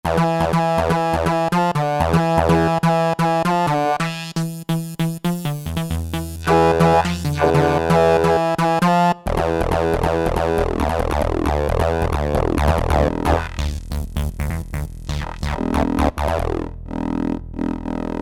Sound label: HiLo 303 Mod